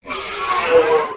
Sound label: Guerrero Death; Grounch